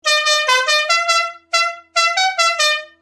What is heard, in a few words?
Musical Car Horn